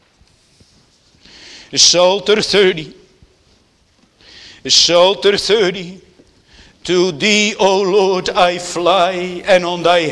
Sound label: Singing; Netherlands Reformed Congregation, St. Catharines, ON